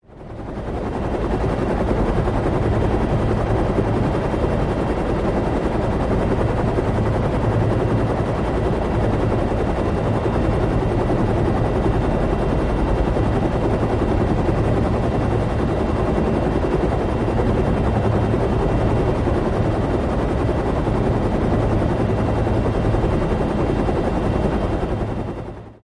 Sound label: Uh1 Huey Interior Fli